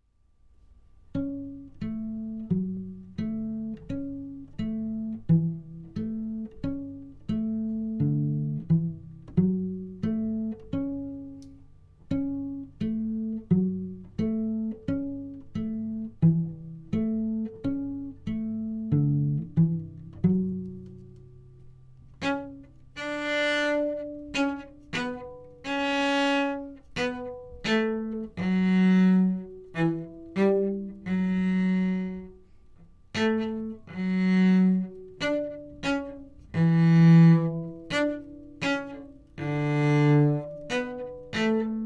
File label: Cello